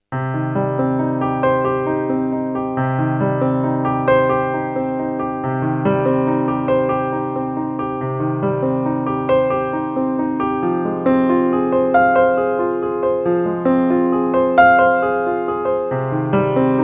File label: solo piano